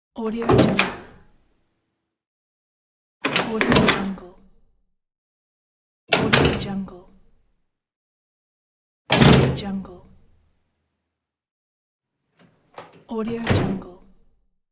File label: metal door close sound pack; domestic sounds